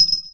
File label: fall suzu